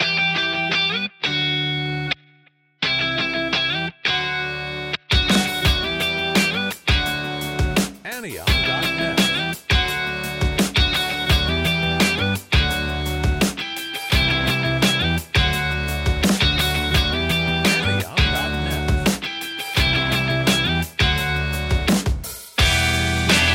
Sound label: indie pop rock